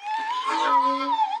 Horse - Whinny; Ed Edd n Eddy; Adromulus Pack

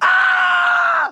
Scream long; EDDY; Adromulus Pack; EEnE

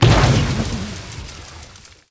Explode - Water Bomb; Ed Edd n Eddy; Adromulus Pack; EEnE

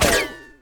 Launch; Ed Edd n Eddy; Adromulus Pack; EEnE

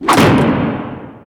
Door Slam; Ed Edd n Eddy; Adromulus Pack; EEnE

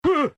"Ugh"

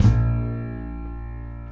Band Hit Dun; Ed Edd n Eddy; Adromulus Pack

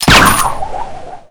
grappling hook shot, weapons

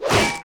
Impact; Ed Edd n Eddy; Adromulus Pack; EEnE

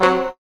Band Hit; Ed Edd n Eddy; Adromulus Pack; EEnE

